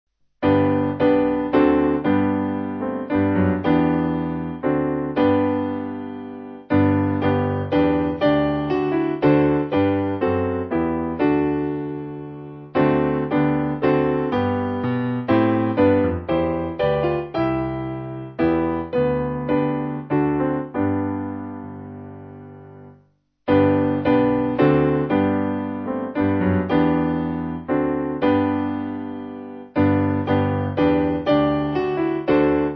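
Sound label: Piano, Music Backing Files for Churches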